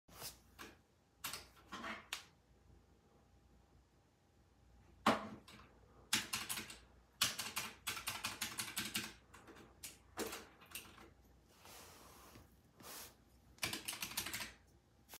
calculator; ماشین حساب

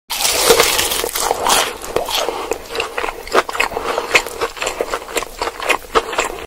Fried Chicken Eating

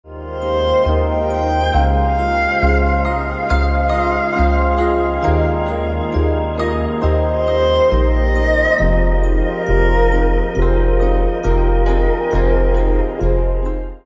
Shaolin Temple, موسیقی مدیتیشن

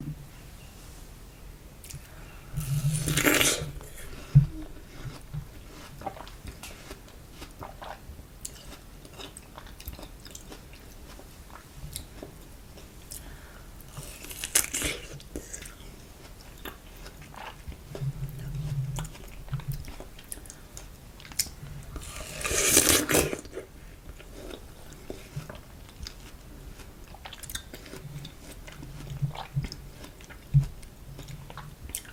watermelon asmr

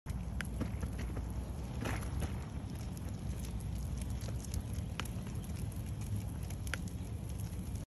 baby carriage; کالسکه کودک

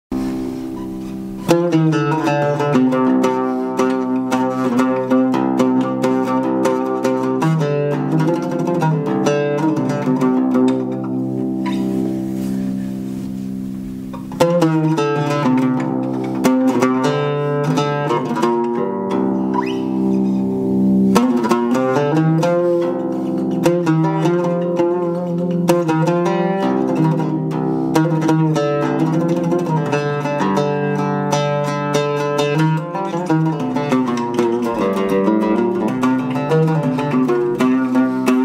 Barbat in background; موسیقی بربط در بکگراند

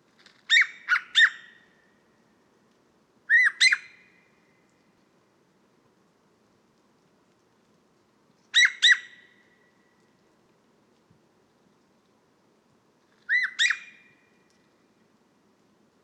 Siberian jay, پرنده جیجاق سیبری

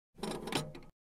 scales

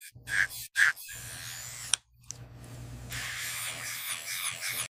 Tooth polishing; پولیش دندان

